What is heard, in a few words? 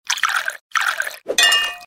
throw wine